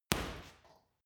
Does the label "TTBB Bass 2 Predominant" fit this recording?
No